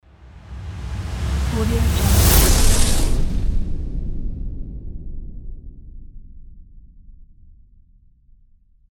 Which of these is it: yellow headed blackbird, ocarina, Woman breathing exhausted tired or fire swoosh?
fire swoosh